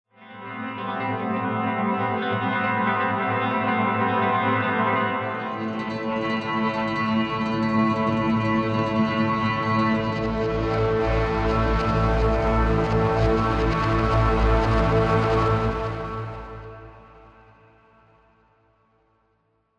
Scoring Guitars Ambient Beds and Drones

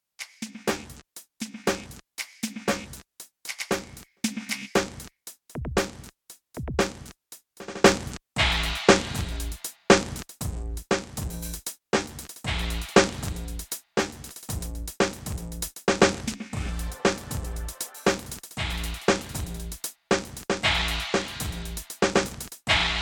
YAMAHA DTX10K M Off the Hook

